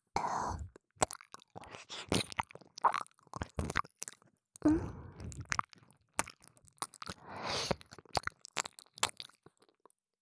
ちろちろと突っつくように, 耳舐め; おまけBGV

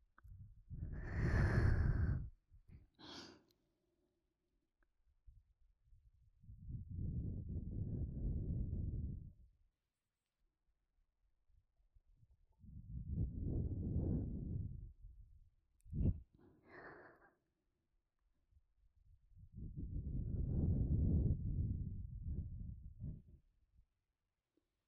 耳舐め攻め

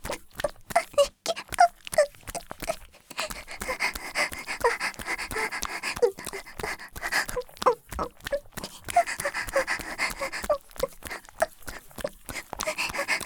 ギシアンループ; あ～にき！いくら出す?

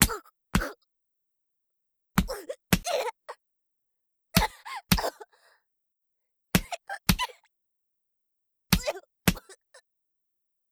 ストレート(威力高め) BPM110 ワンツー; 効果音あり

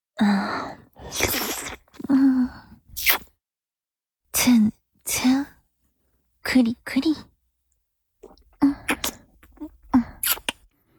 乳首舐め(弱):片方を指でいじりながら、もう片方の乳首を舐める, チュパ音; チュパ音抜粋集

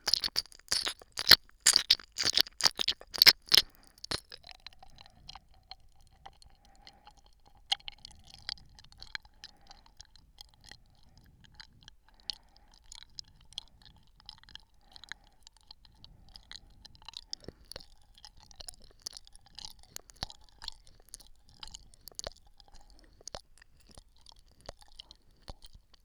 左耳 深め

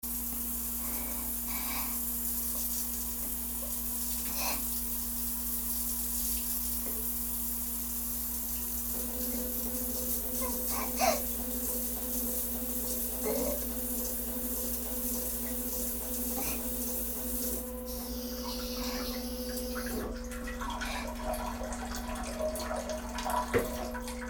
ウォシュレット、吐き出し音あり; トイレ

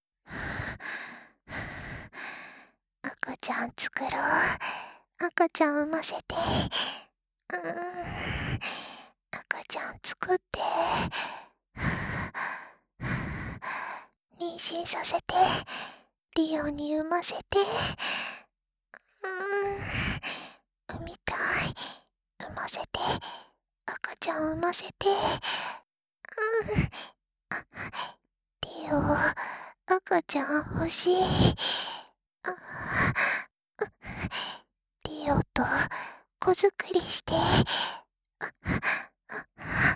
喘ぎ; 赤ちゃん作ろ♪; ハーレムオナサポ 4人のメイドがご主人様のオナニーをお手伝いするバイノーラル～文、千曜、名月、莉桜～

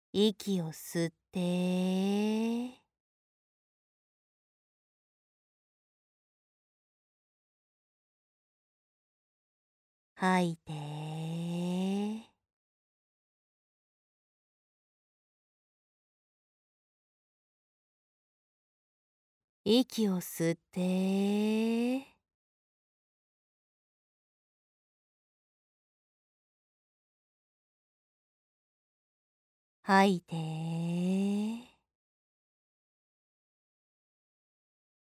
息の吸い吐き3分間8秒間隔

